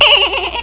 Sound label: laugh; gophers